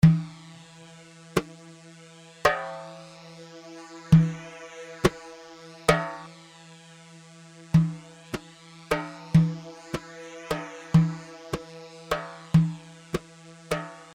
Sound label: Tar; طاره